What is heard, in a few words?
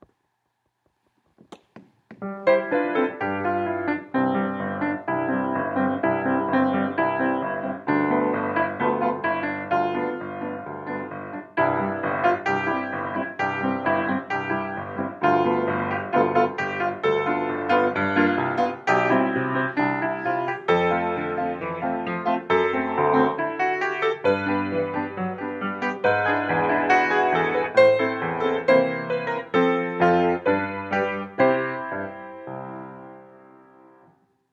do re mi accompaniment